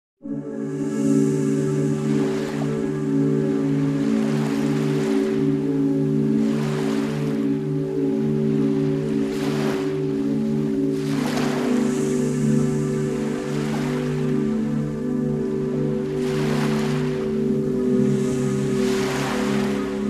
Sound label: Deep Sleep 456 Hz